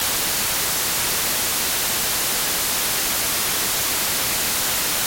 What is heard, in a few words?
spectro noise